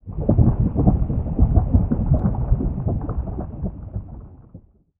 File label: Sound Scary Pit